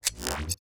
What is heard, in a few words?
Drone Laser; TANK; 3Souls